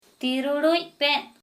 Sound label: flute